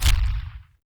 shotgun fire end, weapons

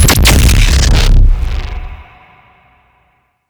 bfg fire

